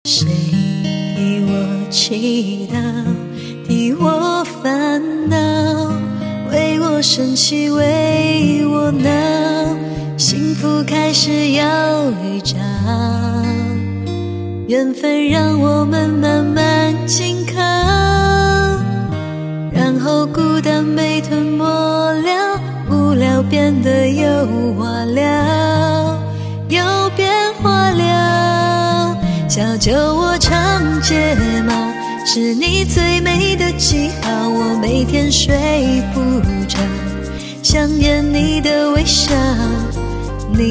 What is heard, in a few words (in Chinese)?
翻唱